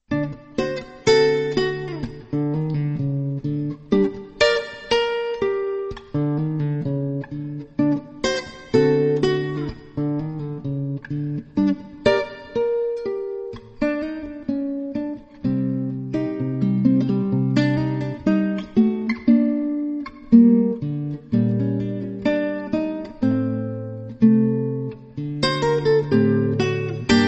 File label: klassik mix